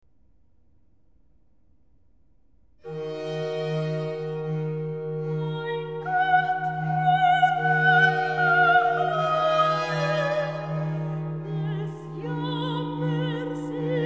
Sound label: Recitativo soprano; BWV155 Mein Gott wie lang ach lange; Met Bach naar de mis in Weimar (1716)